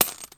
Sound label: coins bag